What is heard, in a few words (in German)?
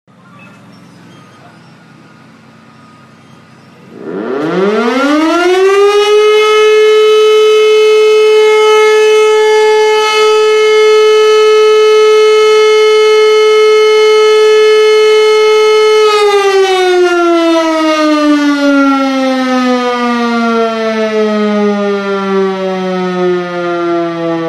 Sirene Feueralarm; toene